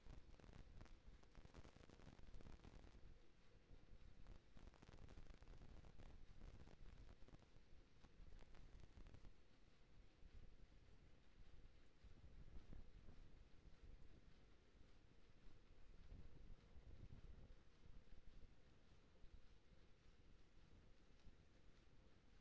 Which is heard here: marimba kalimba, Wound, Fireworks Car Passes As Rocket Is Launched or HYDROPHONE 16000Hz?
HYDROPHONE 16000Hz